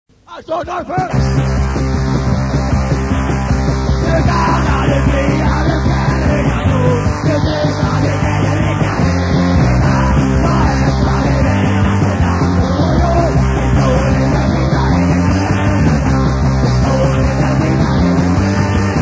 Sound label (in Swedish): Folkmelodi